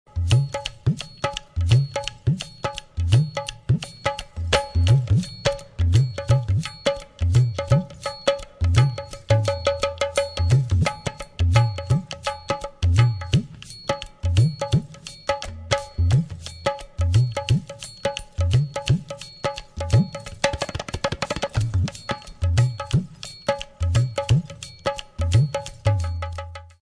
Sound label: dhol, drums of india